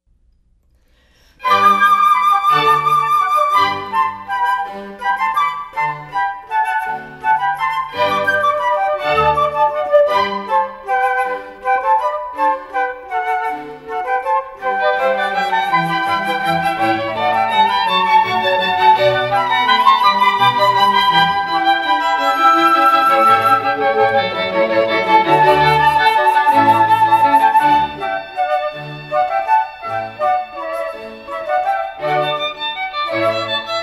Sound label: flute; Brandenburg Concerto No.4